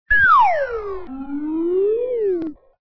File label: Ghost